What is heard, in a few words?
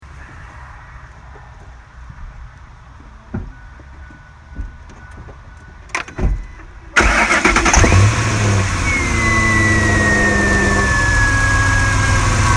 clicking noise over bank 1